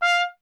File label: trumpet, F 1; Miroslav Vitous; Solo Instruments VOL-1